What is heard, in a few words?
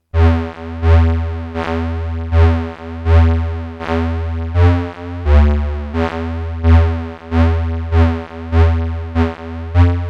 1972 Odyssey attack decay tuning